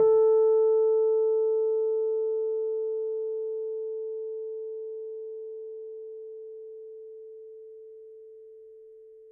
A4 LO, Rhodes Mark II; CEPCo Sample Series Vol. 3

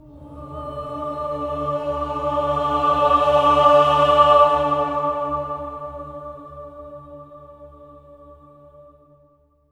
octave A3, swell octave, voices

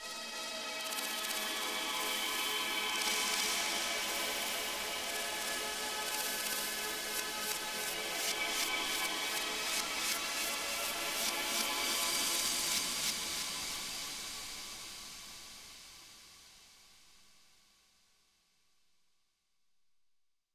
flora pad

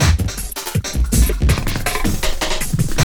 JUNGL 160, JUNGLE LOOP

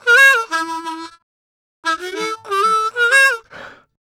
Harm 098bpm A, Harmonica

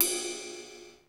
DR550 RIDE; 90 sSampleCDs; Crypton - DrumBase5000

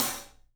SABIAN HAT; 3D Sound; 3D Drums

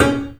PICK D3; InVision Interactive; The Piano

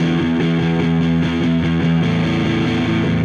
Dirty Chunk Guitar, Indie Rock; Silos; Sonic Foundry (Sony Creative Software); Indie Rock Anthems; Session 11 - Silos

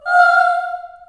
F4 child oh, Choir; Acoustic & Synth; USB Soundscan vol.28; AKAI; CHILD OHS

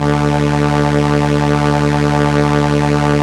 Strings Arp SE D3, ARP Solina

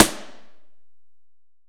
ST AMB SN, STEREO AMB SNARE

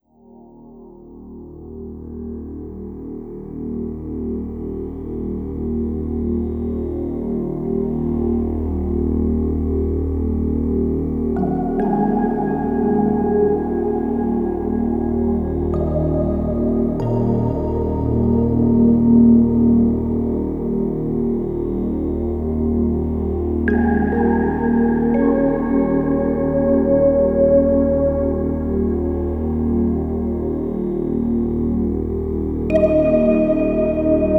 Ambient Bed; Beds; Sonic Foundry (Sony Creative Software) - Slow Silhouette

